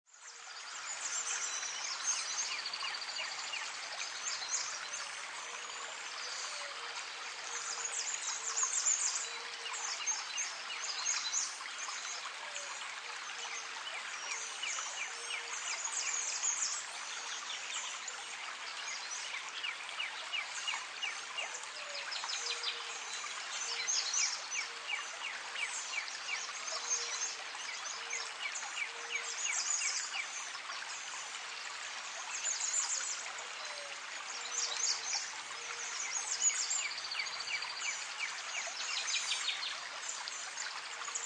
Birds By The Creek, Beautiful, pastoral and peaceful birdsong ambience near a brook or a creek; in park or light forest